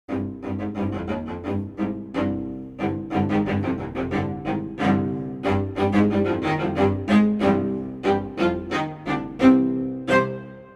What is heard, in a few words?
Cello stacc